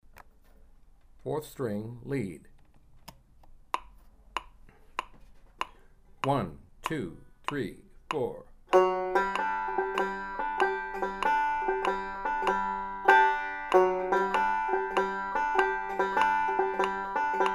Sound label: 4th str lead